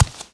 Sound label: walk; FOOT